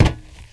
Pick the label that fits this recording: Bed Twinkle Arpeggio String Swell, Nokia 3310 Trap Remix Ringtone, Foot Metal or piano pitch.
Foot Metal